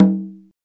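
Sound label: Conga Lo, Roland R-8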